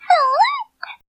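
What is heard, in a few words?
Wii Remote Sound; Peach; Wiimote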